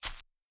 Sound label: snow step, footsteps